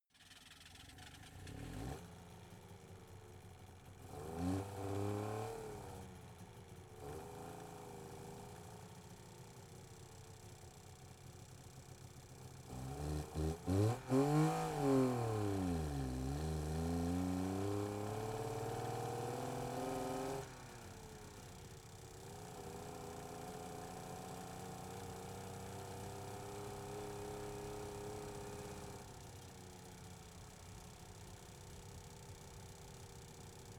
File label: car saab 92 drive slow engine